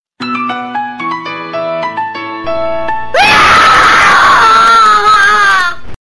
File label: Nokia Screaming Tone